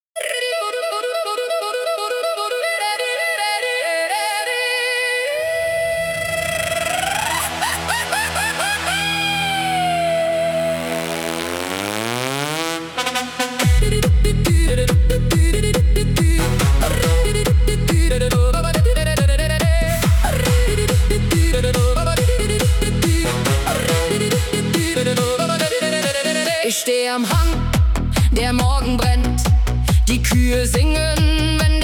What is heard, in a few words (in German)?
Kuhglocken Rave